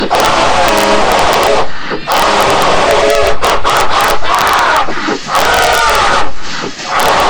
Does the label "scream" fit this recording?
Yes